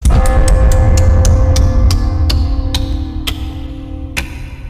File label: low pitched bell